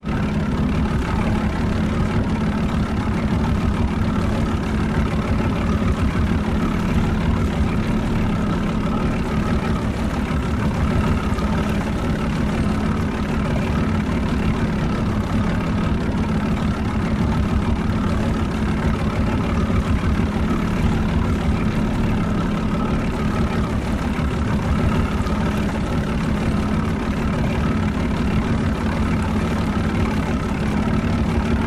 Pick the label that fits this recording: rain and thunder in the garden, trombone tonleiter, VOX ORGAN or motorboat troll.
motorboat troll